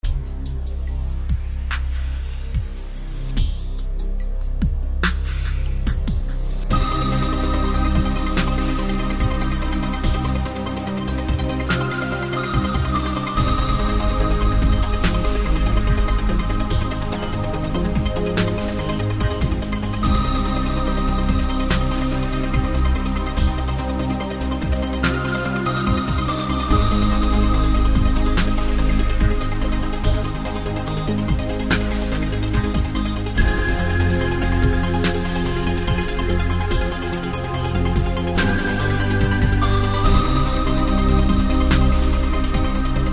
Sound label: rotation ring